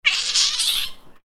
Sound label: angry cat